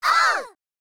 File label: people shout "oo"